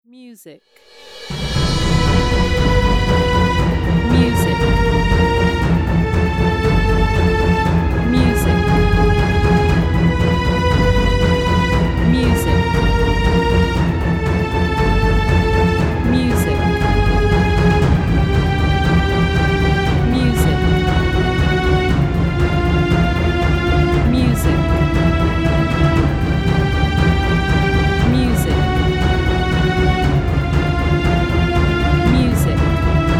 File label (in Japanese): ホラーゲーム逃げるBGM